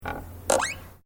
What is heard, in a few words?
Wood Creaking; Material components for constructing more complex sounds; Materials